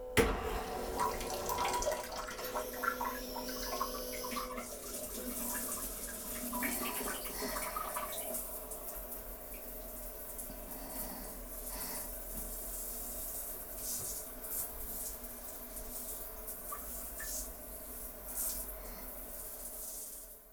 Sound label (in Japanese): ウォシュレット、吐息